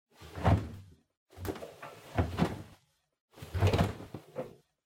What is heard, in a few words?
Washer Front Load Clothes Take Out